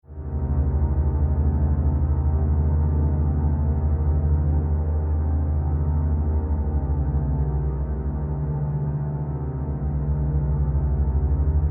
Drone Horror Tonal Haunted Atmosphere Ominous Suspense Eerie; Drones; Sound Design; Room Of Suspense